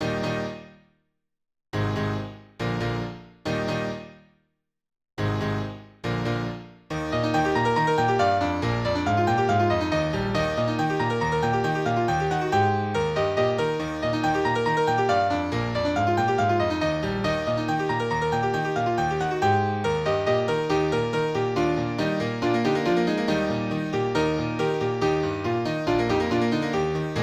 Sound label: Piano Cover; Pony Music Archive 20.09